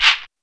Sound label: PERC